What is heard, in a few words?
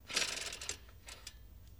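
Bike Single Pedal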